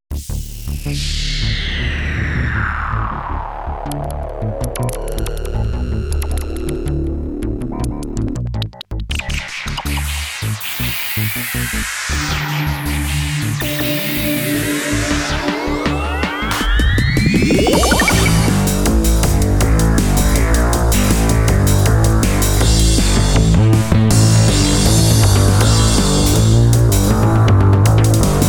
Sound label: baroque